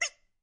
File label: quack